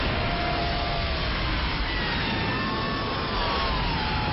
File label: Dart Sound